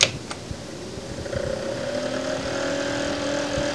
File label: fan, Altair32 Emulator; MITS; Altair32 Emulator for Windows v3.20